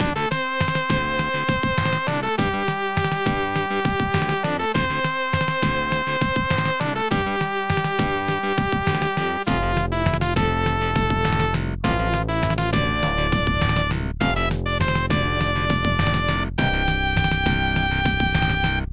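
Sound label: background music; Street Fighter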